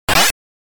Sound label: Cut with a Sword